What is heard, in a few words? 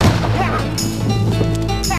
death; singe